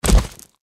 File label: deathclaw foot run; npc